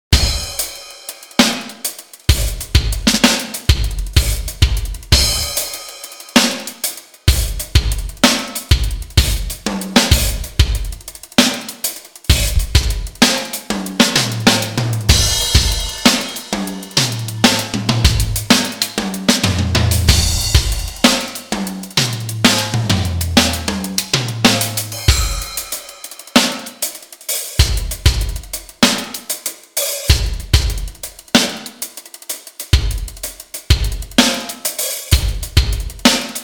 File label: verb test Live Drum Room